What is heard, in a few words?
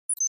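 Flash Beep Electronic Tap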